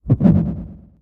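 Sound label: perceive intro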